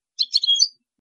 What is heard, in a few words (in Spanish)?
chicolios; campero